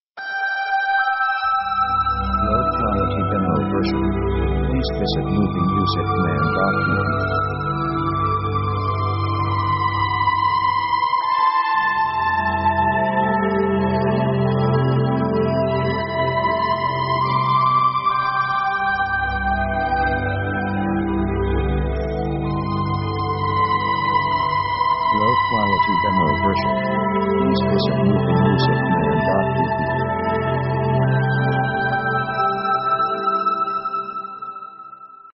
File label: synth romantic 70s; synth themes